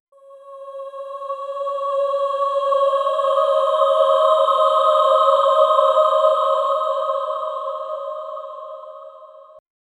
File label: ethereal choir whispers, ghost